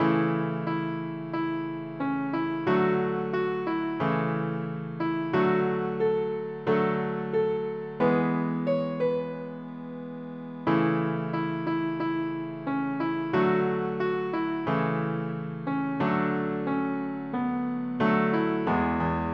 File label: piano slow; PSE Music; Sing Joyfully; slow tempo; PlayScore